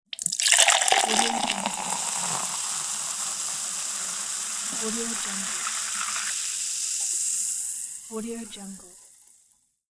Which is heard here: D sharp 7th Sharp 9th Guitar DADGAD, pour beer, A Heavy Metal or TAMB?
pour beer